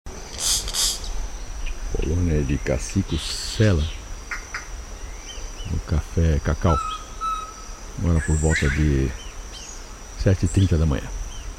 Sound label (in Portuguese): Cacicus cela imitando Glauc minut; Itacare